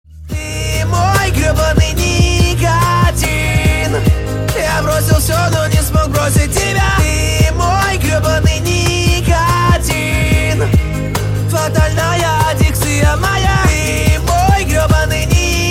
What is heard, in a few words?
acoustic